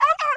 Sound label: grey wolf baby attack